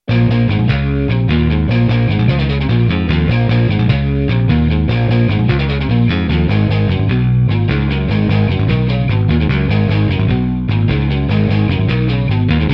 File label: cobalt open guitar